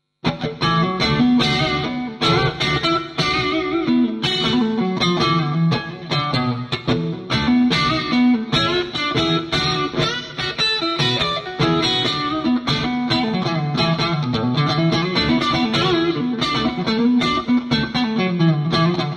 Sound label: funk crunch